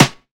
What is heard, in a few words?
good snare drum D key